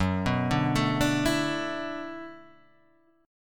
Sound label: E 7th Suspended 2nd Suspended 4th, F sharp, chord; E7sus2sus4/F# arpeggiated